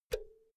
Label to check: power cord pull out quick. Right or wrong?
Right